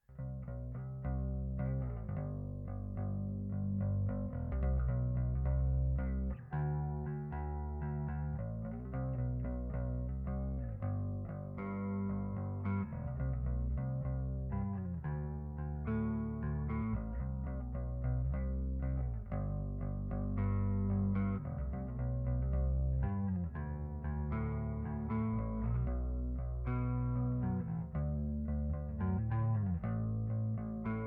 Bass; FULL BAND

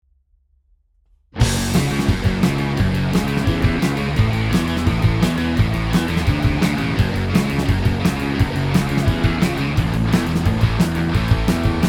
w vocals

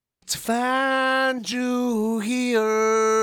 Vox; TLM 49

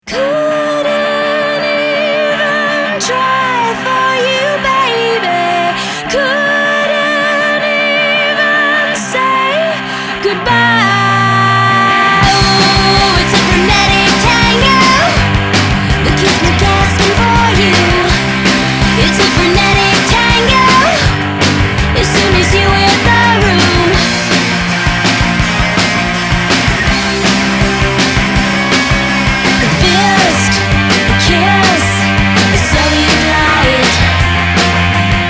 Frenetic Tango

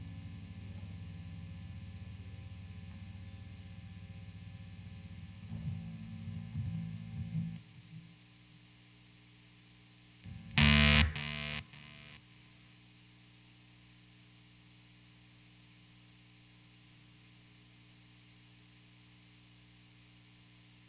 M160 Bass Guitar Amp

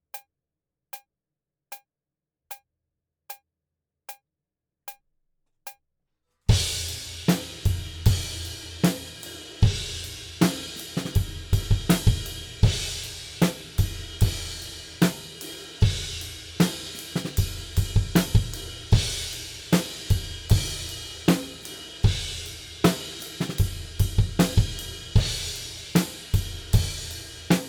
Drums ONLY; Pre Pro

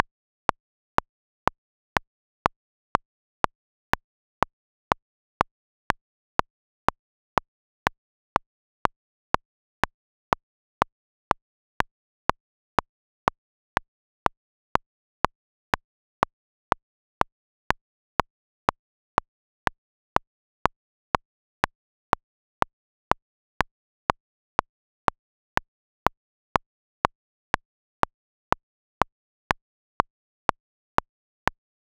Click 122 BPM

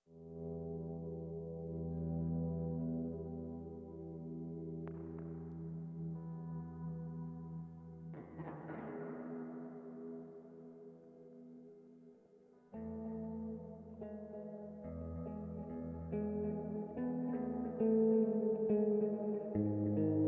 DI Line Guitar

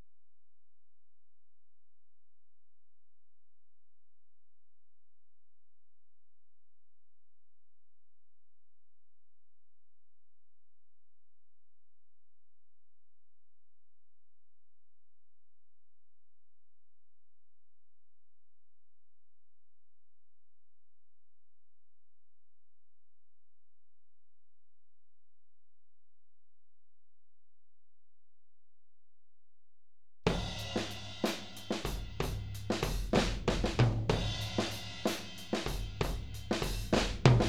Toms Mix Drums

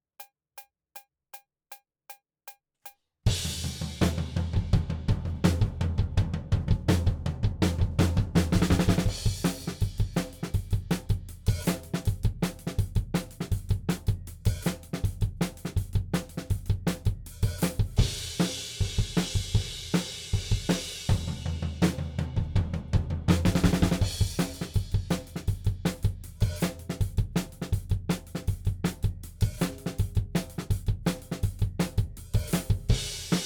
Drums ONLY; Pre Pro

